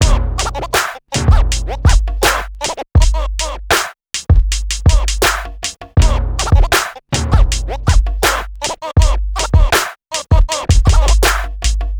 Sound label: raw dawg loop 160 bpm